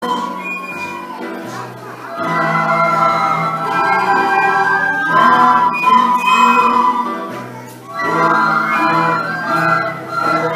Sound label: recorder